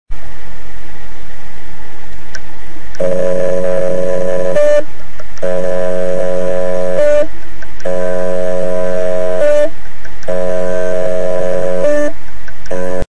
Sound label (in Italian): Western Digital – motore bloccato, stuck spindle, hard disk; wd 500gb